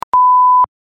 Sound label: beep